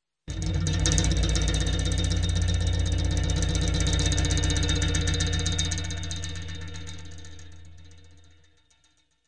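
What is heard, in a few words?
spinning shaking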